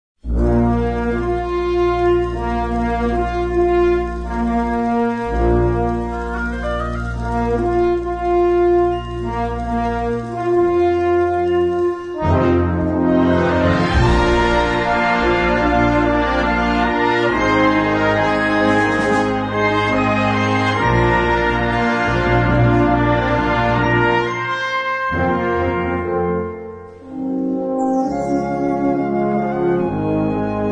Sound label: drones